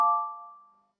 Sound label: pluck